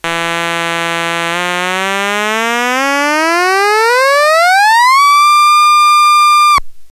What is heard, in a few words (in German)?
Otamatone Deluxe mittlere Range